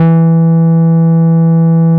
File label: E4, Boom da Bass; E-MU Producer Series Vol. 6 – Analogia Project (CD 1)